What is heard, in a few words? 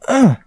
exert; blindside